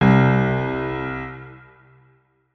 notes, keyboard piano; Hideout Furniture

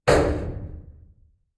ambient soundscape underground

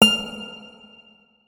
notes, guitar; Hideout Furniture

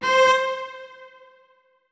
notes, keyboard; Hideout Furniture

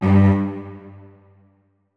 notes, keyboard strings; Hideout Furniture

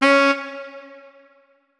notes, keyboard saxophone; Hideout Furniture

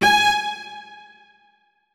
notes, keyboard; Hideout Furniture

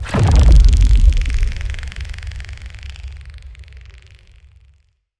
net blowout, anomalies; Arrival

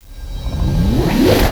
slowmo off; Bullet Time

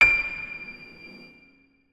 notes, keyboard piano; Hideout Furniture

